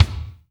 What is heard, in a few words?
BD, DR BD; Best Service Gigapack 2